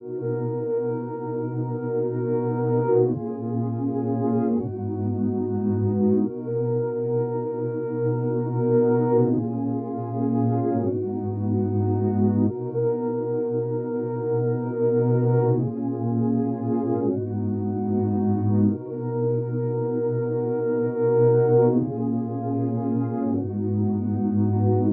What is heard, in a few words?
154 BPM B Min Synth; Melody Loops; Into Pieces; Cymatics; ANALOG EVOLUTION Beta; RnB Collection